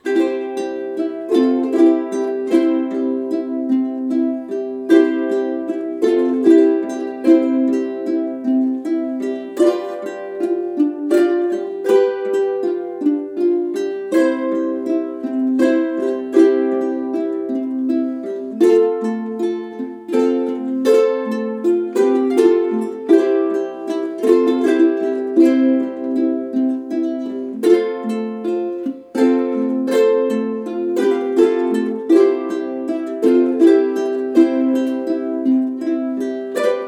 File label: Silent Night, Ukes